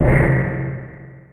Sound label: ladder gong